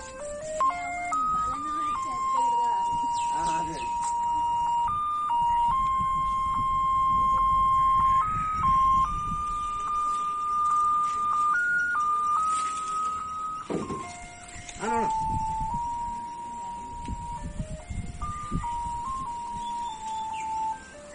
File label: sri lanka chun pan music